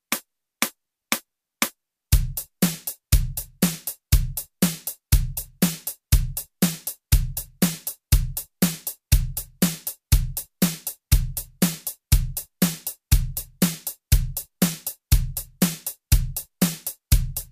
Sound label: Roland 120